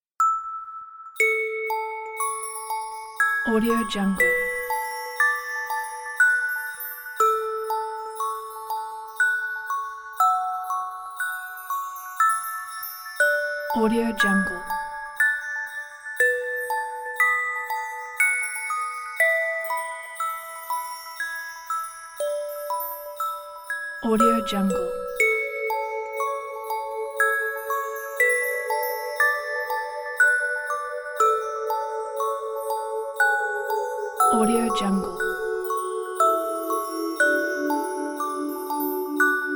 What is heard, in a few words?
christmas calm relax melody